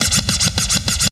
SCRCH

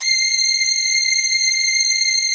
PAD, ATMO PADS; Best Service; XX Large

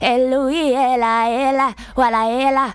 VOC

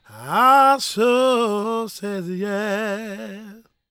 GOSP MALE, Vocal Planet Gospel

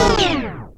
PERC; ZERO-G; Datafile

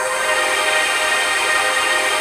ATMOPAD; Best Service; XX Large; Pads

